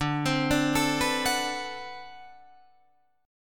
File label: D 13th D, down, Guitar, Drop D